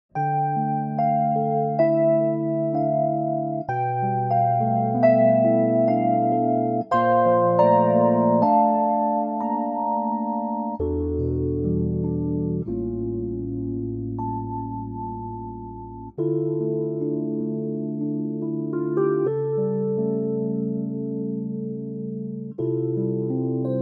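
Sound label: Dm Arturia electric piano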